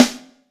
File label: snare; DirectX SDK; DMusProd; lbsnare